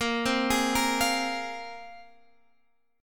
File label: A sharp 7th Suspended 2nd, Guitar, Standard; arpeggiated; 18,15,18,15,18,x; capo=2